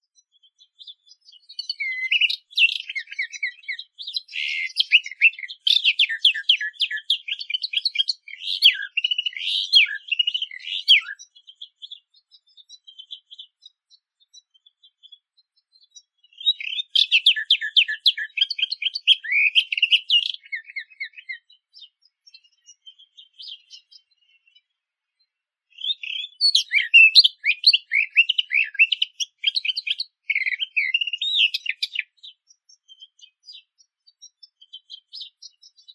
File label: eastern orphean warbler